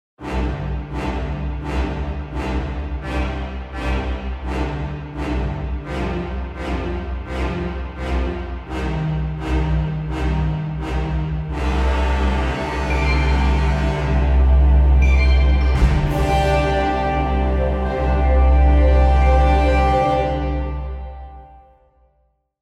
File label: jingles for fairytales